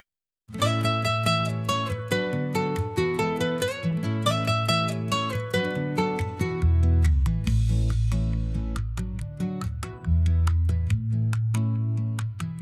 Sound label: instrumental